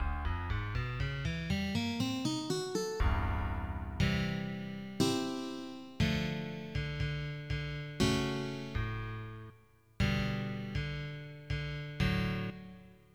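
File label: Electric Guitar; FF7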